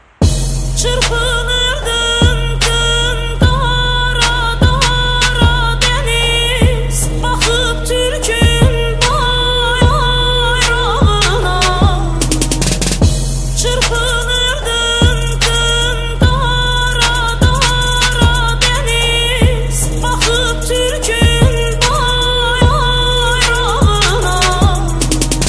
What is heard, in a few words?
Trap